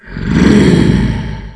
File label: BackGround Goblin; Eft